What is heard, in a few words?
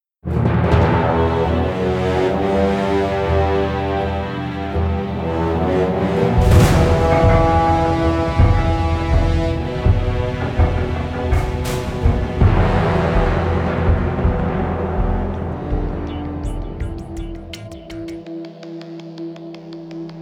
Suspense